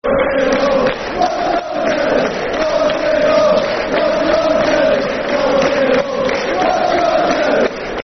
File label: Boro Stayed At Home, Football Chant (Soccer Song); Newcastle United Nufc